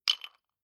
Bar Drink Ice Cubes Dropped Into Empty Glass Clunking Rattle; Drink Mixing; Tom Hutchings